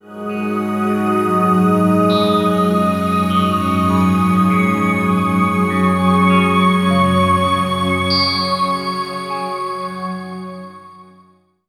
Hi Complex, Complex Wave

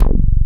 low end bass; Masterbits; Dance Trax

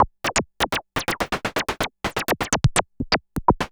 SH101 Clocked LFO Rhythm (125BPM); noise hiss crackle

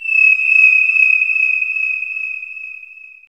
SYN FX; X-Static Goldmine 1

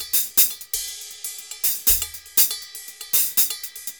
RIDE, MERENGUE; Best Service ProSamples vol.14; AKAI

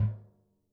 XKICKS, Living Drums; Sampleheads; Peter Erskine Living Drums

